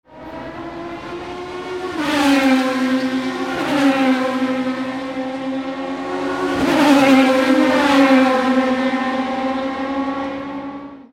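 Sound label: toyota formula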